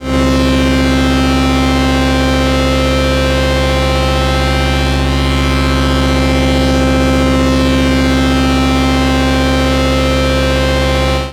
Juno Synth C3

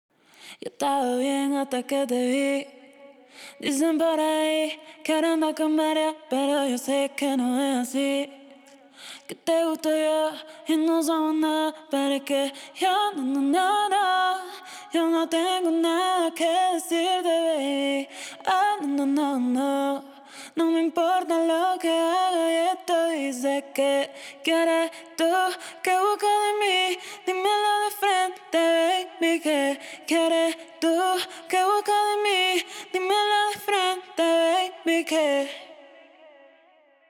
85 Cmin Lead Vocals Wet; Quieres

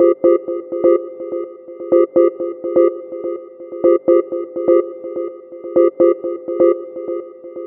synth 125 F

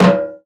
Percussion Shot Dry F

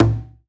Tom

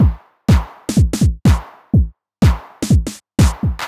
drum 124 deep

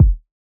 Kick

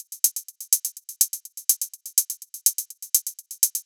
Hat Loop

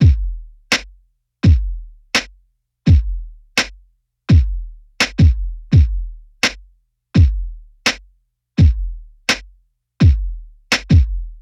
84 Drums Reggae Simple

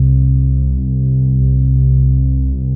Reese, Clean

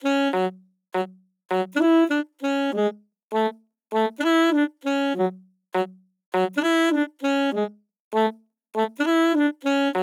100bpm Sax DRY F m

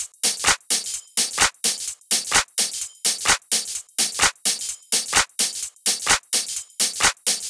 Drums Tops, 128

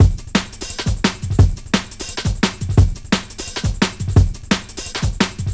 173 Drums Full

